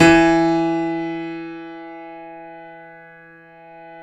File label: YC7 piano, ff; Roland L-CD701; ff YC7 St